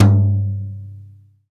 TOM XBRUSH, Brush Kit

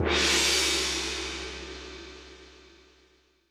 percussion, Roland TD-20

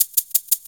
LOOP, 174 BPM, Drum & Bass; Ueberschall; Drum & Bass Flash Trackz

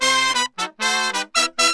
MERENGUE

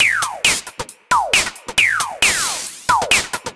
Lazer Face 135BPM, sci-fi Beats

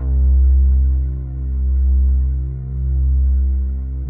HORNS C2

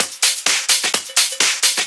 Discohouse Loop 128 BPM

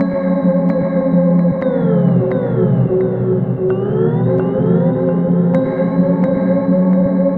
Synth Chords; Eclectic Electronica

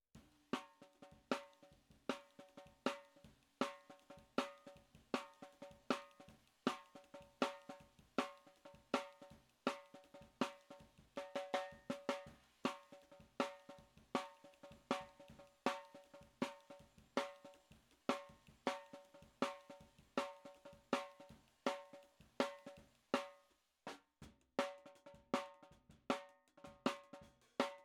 SNARE, DRUM N BASS:JUNGLE BEATS; THE RAIN RIDE CYMBAL; RAW MULTITRACKS

